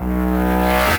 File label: Synths B; vengeance essential club sounds-3